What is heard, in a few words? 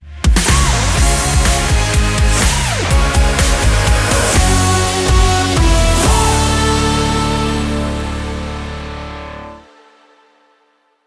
JINGLE; RADIO CHABLAIS; AGAIN